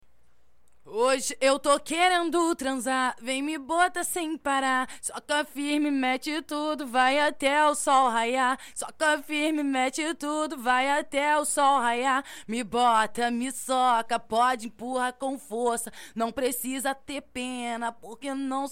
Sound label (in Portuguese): acapela soca firme